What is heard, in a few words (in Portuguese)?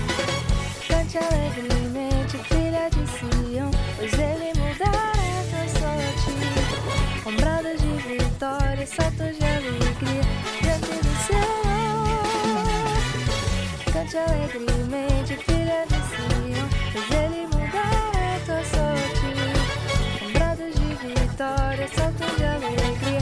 CONTRALTO